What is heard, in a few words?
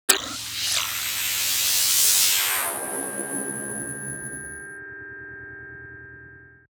Sci Fi Transition; Digital Rain Lab; Sci-Fi Transitions